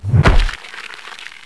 panther claw strike; svencoop; sc strangers

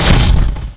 air door; svencoop; quake1

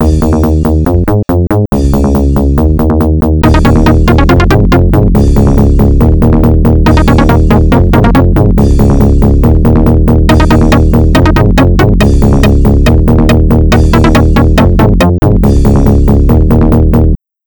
outro; svencoop; rc labyrinth

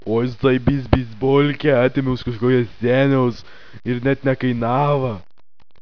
zo idle, zombie; svencoop; cracklife

